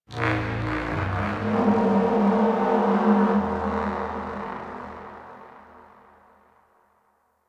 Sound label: Crp Ship Energy B Texture Large; Ambience; Warframe; Corpus Ship Remaster